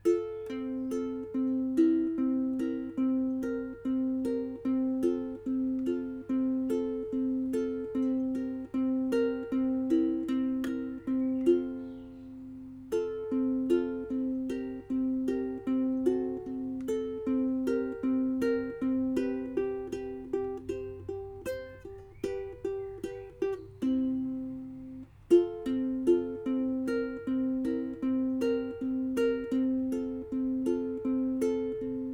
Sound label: etude mic, ukulele